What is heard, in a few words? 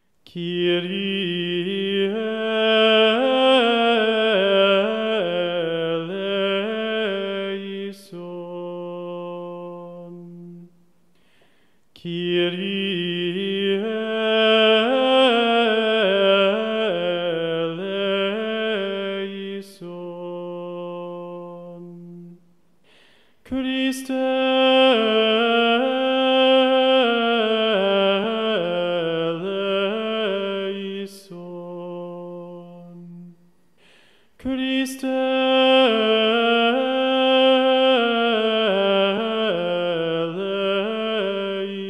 Mass xvii Kyrie B Lent; choir schola